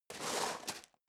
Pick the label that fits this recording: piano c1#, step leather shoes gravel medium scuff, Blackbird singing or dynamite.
step leather shoes gravel medium scuff